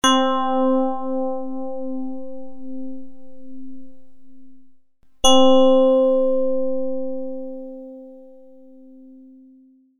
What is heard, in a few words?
Full Tines